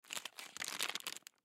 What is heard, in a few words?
Cupcake Grab Wrapper Snack Junk; Food; Blastwave; Foley